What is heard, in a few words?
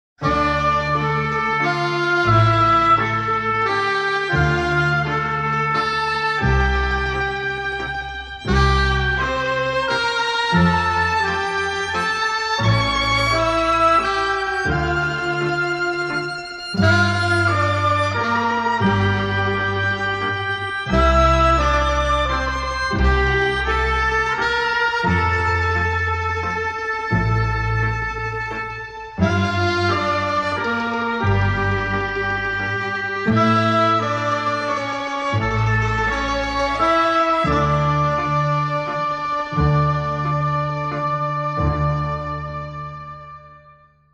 Orchestra & Saxophone